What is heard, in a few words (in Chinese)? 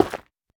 下界岩破坏声